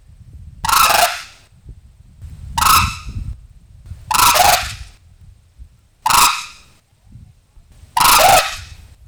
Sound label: Alarm White naped Pair, cranes